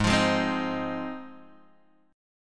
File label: Ab 6th Ab, Guitar, Open G